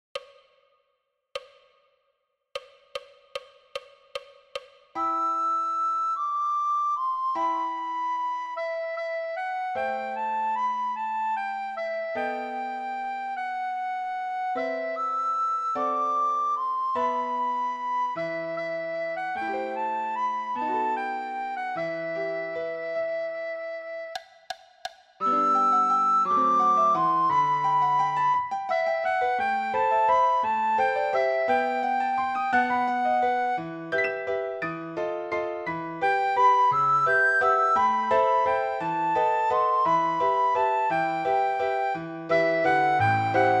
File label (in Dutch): sopraan; Flierefluiter 6